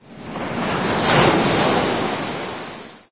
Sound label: travel empty recycle bin; windows 9x; sound schemes